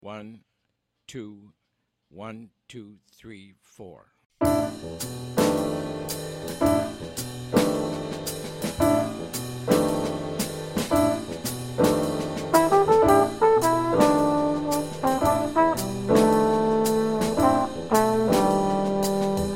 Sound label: minus Bass; count off sets up the intro; play the intro; play figures and walk for the melody 2 times